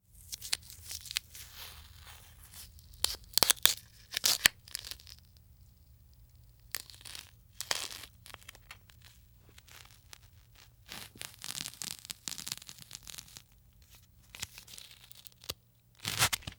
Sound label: Duct Tape Peel Rip